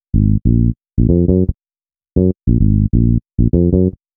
Bass, Hip-Hop

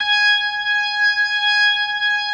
G#5S, B3, Vintage Keyboards

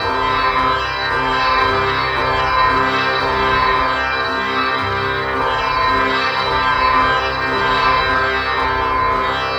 Infinite Sitar, Strings; Sonic Foundry (Sony Creative Software); Myths of Technology

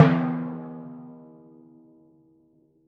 E2 f, Timpani, forte; PowerFX; Downtown Orchestra; scales

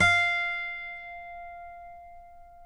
F, guitars; Spectrasonics; Hans Zimmer Guitars VOL-2; FNGSTYLE PFM

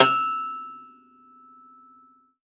pno E4, 10th Flageolets, Total Piano

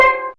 Steel C4, Fairlight CMI IIe, Mallets

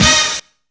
SAXY STAB; Zero-G; Funky Elements

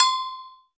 new agogo; E-MU Formula 4000 Series Vol. 2; OrbitPercMaster

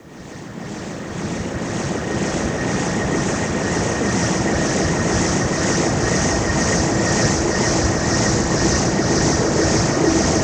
LOW ATMOSPHERE SWIRL, DEEP ATMOSPHERICS; Sonic Foundry (Sony Creative Software); Vir Unis Infusion

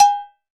Cowbell; Roland BK-5; Percussion

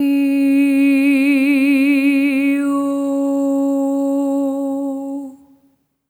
EH OH C#4, SOP; Spectrasonics; Symphony of Voices

